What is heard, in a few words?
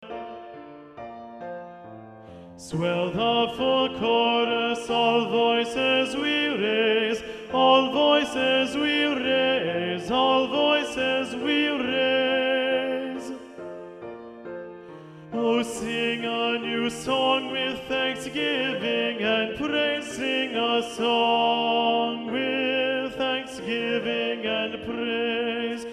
Tenor; Swell the Full Chorus; Regionals Practice Tracks